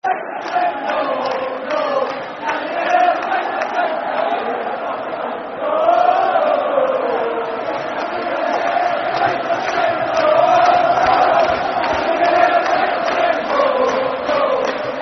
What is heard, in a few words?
Can You Hear Dunfermline Sing [Football Chant (Soccer Songs)], Falkirk; fanchants